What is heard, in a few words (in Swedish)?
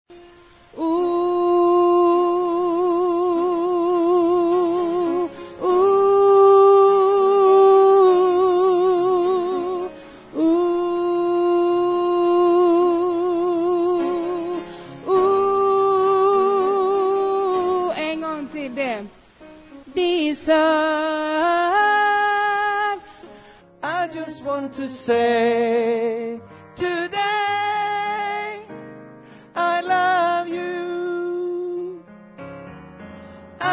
sopran, stammor